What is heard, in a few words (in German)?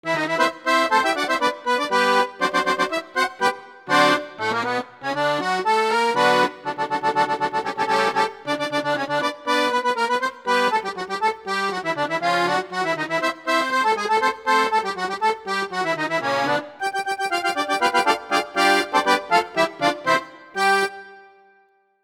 Alpine Volksmusik Steirische